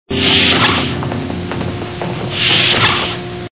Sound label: doors; Star Trek